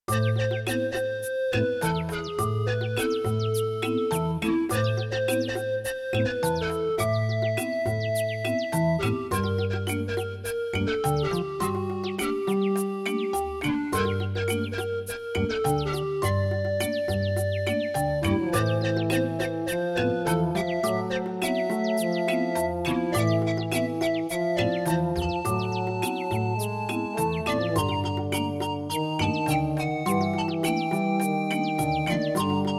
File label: CM500 GS; LSL3